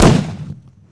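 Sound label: grenade launcher, weapons; scmod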